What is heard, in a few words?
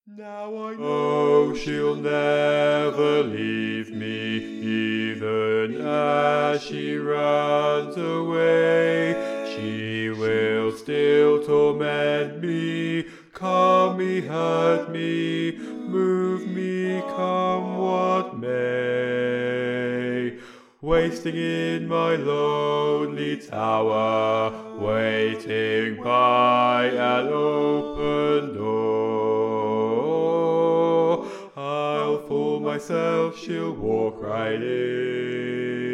Bass, barbershop tags